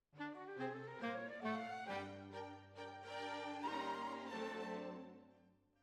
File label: Oboe